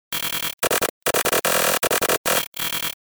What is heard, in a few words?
Glitch